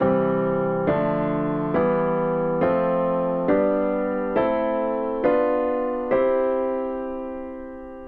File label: Major Mode